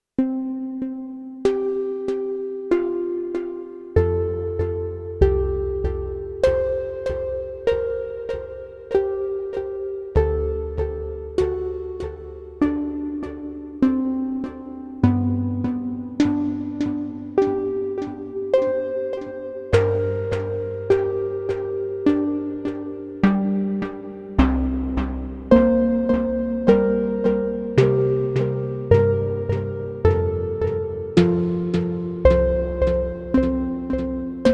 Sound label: Beat Sync Delay